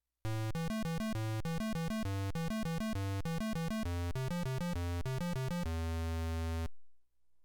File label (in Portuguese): TonePlayer acomp, arduino; tocando musicas no arduino com dois tones ao mesmo tempo